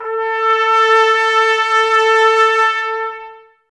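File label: BRS trumpets A; 90 s Sample CDs; Miroslav Vitous; Woodwind Brass Ensemble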